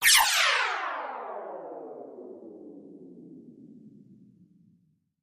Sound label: Sharp Slicing Zap